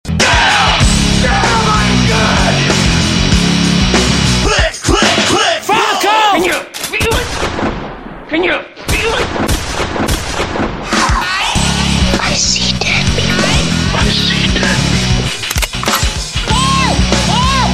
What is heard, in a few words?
Mixed Guns